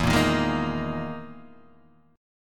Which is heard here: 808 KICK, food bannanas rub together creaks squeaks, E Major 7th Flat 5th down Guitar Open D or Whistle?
E Major 7th Flat 5th down Guitar Open D